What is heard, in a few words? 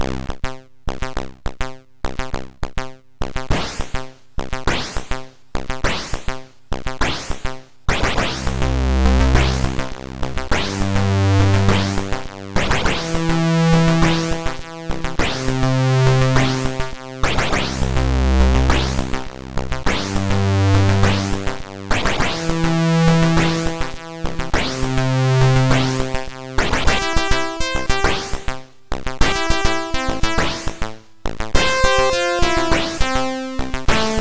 Super Synth Sample, sid MOS6581R4